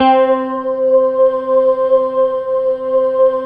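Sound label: synth Morpheus; Sound & Vision - Gigapack II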